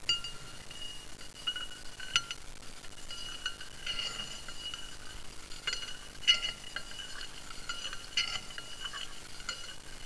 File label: glass sound; ambience